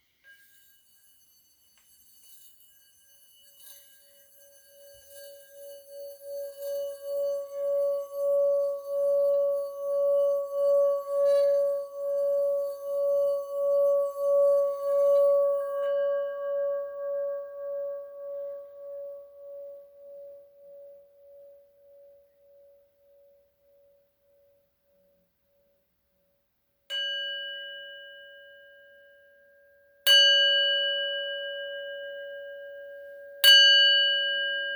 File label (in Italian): Campana Tibetana; yoga; lezioni di yoga